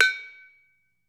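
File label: HIGH BAR, Metalophones, Pitched Percussion; E-MU Producer Series Vol. 7 – Old World Instruments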